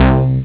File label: bong; xfce